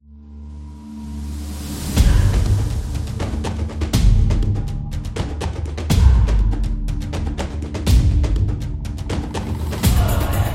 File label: Japanese Drums Taiko Epic